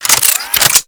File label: Reload, Freeze Ray; Warframe; Corpus; Tennet Glaxion